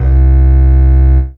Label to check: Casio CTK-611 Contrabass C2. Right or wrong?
Right